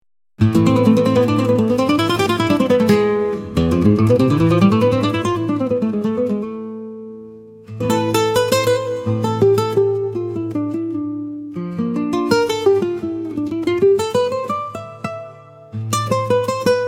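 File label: passionate rhythms, Spanish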